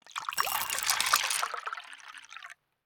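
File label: wings ice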